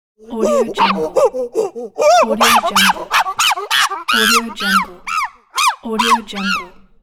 chimp screams

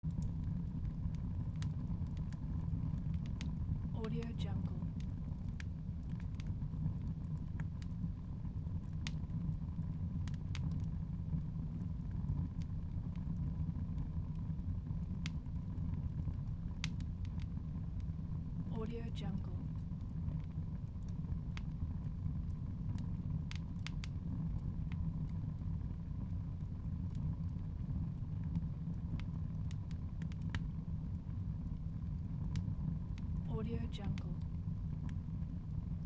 fireplace

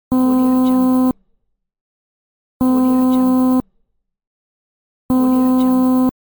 long phone call sound

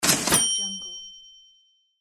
money

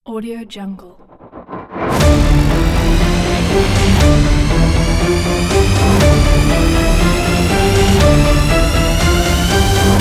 epic logo; docwaxler

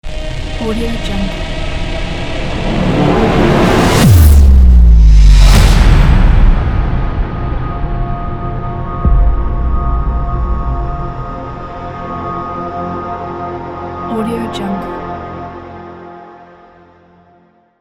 explosive ident

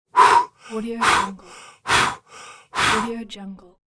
cartoon out of breath

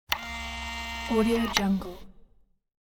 short servo motor movement; hollywoodedge

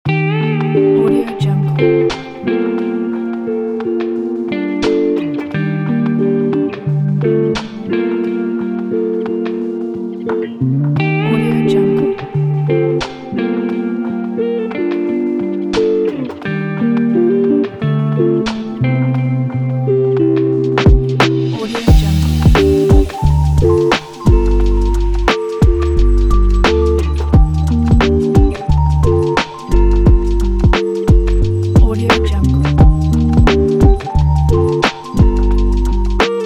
lofi kit

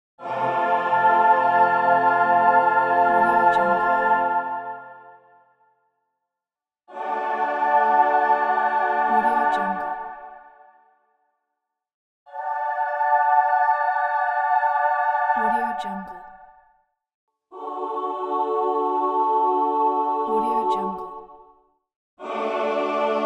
holy revival

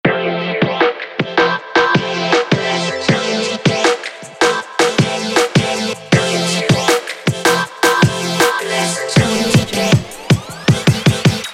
future bass

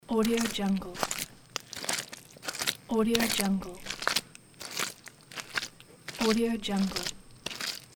steps in mud

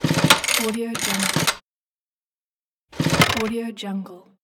boat outboard motor pull cord

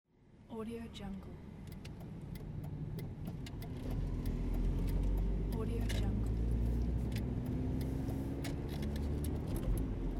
inside car during driving

